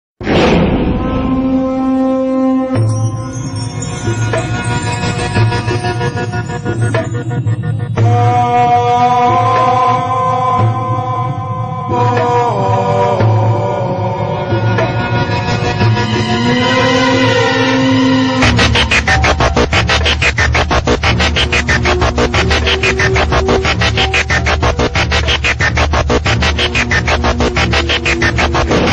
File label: Bg Tune